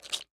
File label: Steps water; meat madness redux; godot; Footstep Sfx